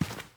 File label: Footstep Carpet; Phasmophobia